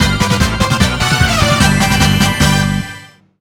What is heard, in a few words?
1UP, Chiptune; Nintendo Switch; Sonic Mania